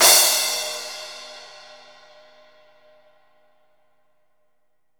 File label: CRASH R, DRUMKIT